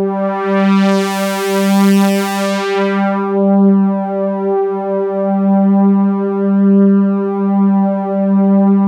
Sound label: FULL ANALOG; Sound Gallery - Charged